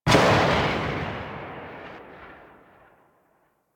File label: Tail Generic Exterior, Weapons